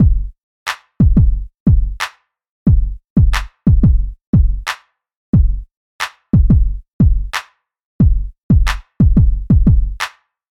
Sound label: Beat